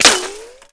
ricochet; Escape from Tarkov

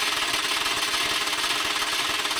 Aircrafts MiG-15bis VK1 End; DCS

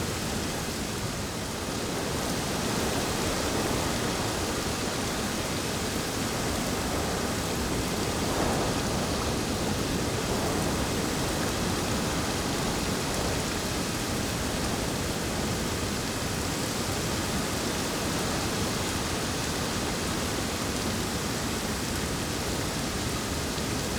ambience beach day breeze rainy; Rust

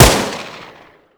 mg 1shot 3d

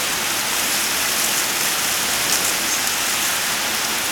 wind grass; Call of Duty Modern Warfare

